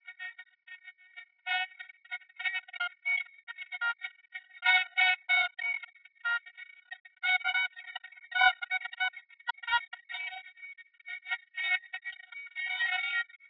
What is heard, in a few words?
Jazz